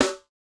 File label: snare, snare house